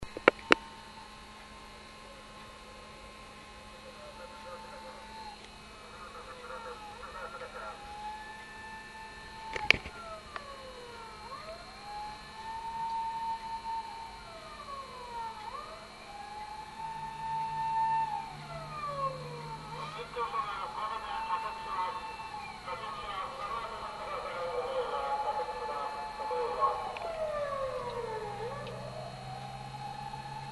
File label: to siren